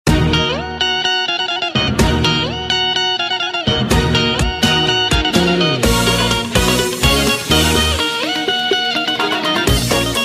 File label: arabic new